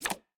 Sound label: pick a card flip; LoL; twistedfate